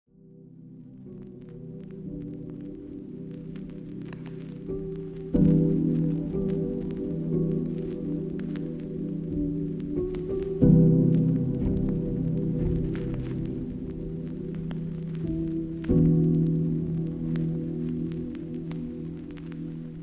SlowFi Beats

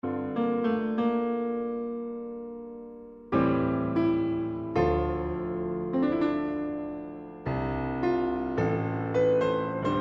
Cello Sonata in D Minor, Prologue

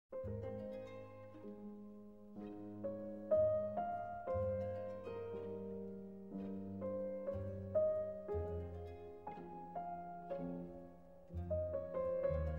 Harpsichord Concerto in F Minor, Largo

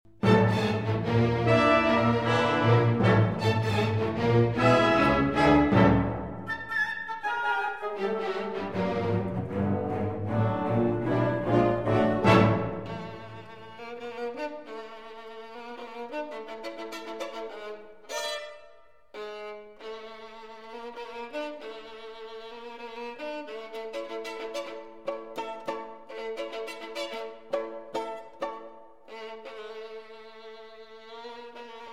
Concerto in D Major for Violin and Orchestra

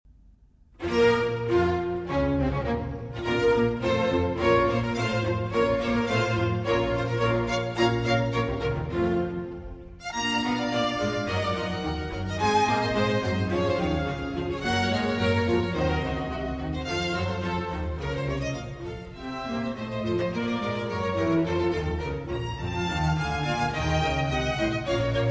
Cello Concerto in B Minor, Allegro; Vivaldi; RV424; 3. Allegro

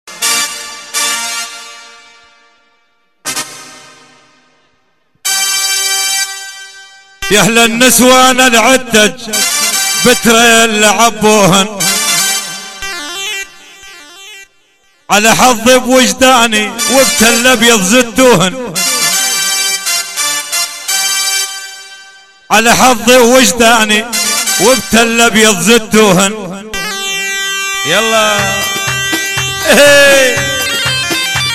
Live Arabic Dabke

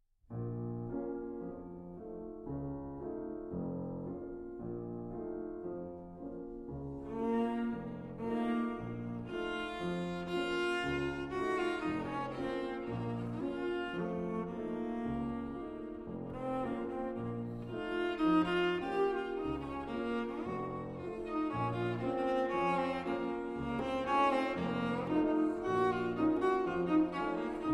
Russian Maiden's Song, for Cello and Piano, Live; Arr. Markevitch; Schloss Elmau, Krün 2016

